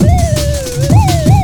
FX BEAT; Big Beat Drumloops; FX BEATS; AKAI

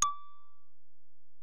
Bell, Alesis DM-PRO

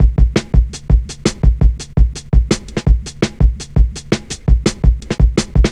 Drum Loops, Jungle; Zero-G Jungle Warfare 1, 2 & 3

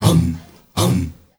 MALE HUM; Spectrasonics; Heart of Africa

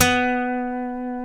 C#, funk guitars

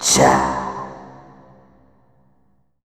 CHAAAW, Tubevox Wet; Effects

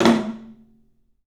PATOM, 3D Drums; 3D Sound

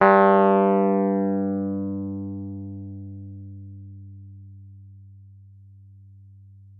WURLITZER, G2; Xsample VOL-1; 90 s Sample CDs; WURLITZER RS

